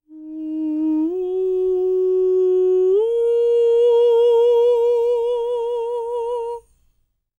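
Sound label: falsetto, Vocal Planet Jazz FX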